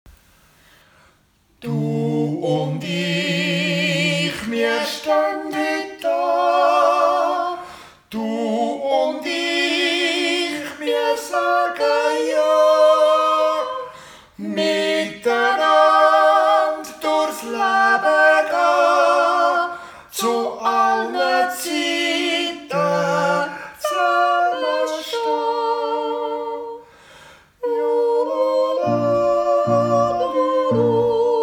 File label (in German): Jodel